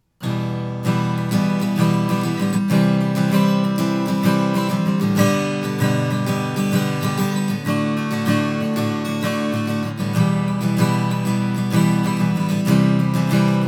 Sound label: Acoustic Strumming